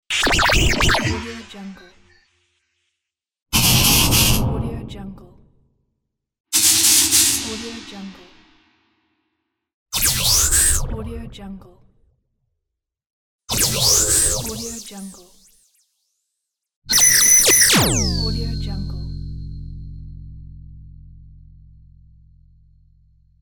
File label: cartoon glitches sfx pack